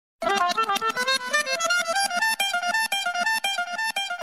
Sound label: Piano Sms Tone